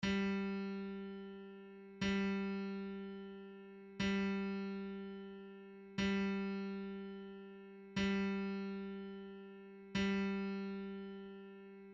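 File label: g string, guitar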